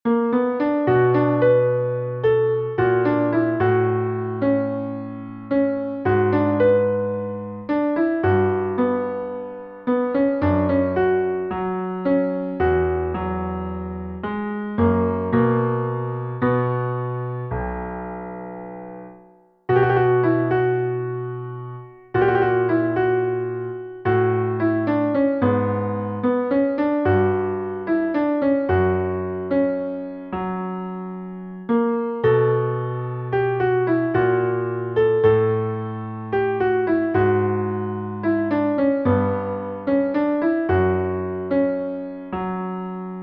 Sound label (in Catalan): Variacions finals piano